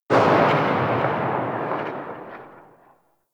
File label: Grenade Launcher Tail; Warframe; Grineer